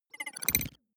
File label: data transfer pluck